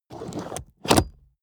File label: plastic draw lock